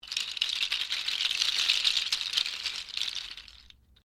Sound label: RATTLE SEED CAPSULES SHAKE, Musical rattle made from seedpods